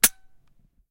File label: no ammo